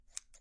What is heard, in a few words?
Scissors Shears; UNUSUAL KITS and FX